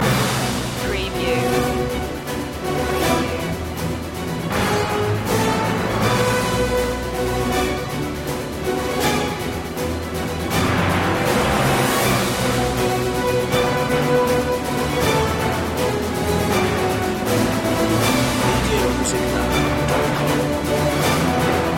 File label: no choir